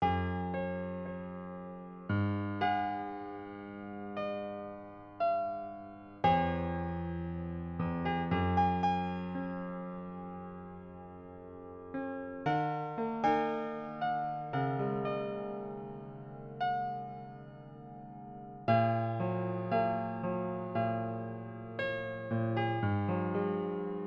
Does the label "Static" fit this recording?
No